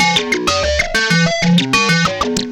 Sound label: arp serge; seq; Sonic Foundry (Sony Creative Software); Ugly Remnants VOL-2